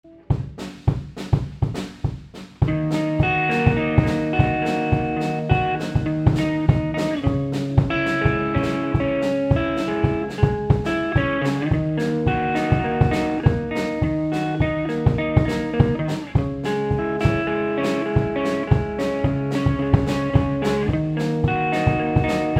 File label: new drum beats